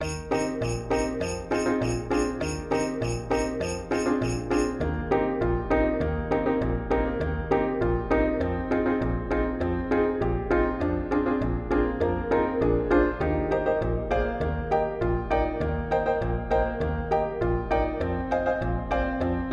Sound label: Jaunty Jingle Bells Eb; Myrtle Amos Melody Makers